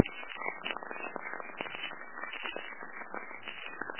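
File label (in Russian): шейкер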